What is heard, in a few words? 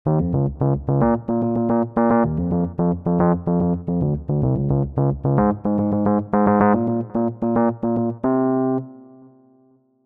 Bass